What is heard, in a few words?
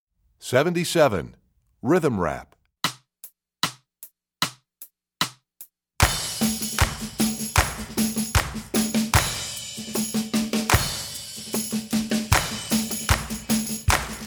Rhythm Rap, Strings; EE2000 Book1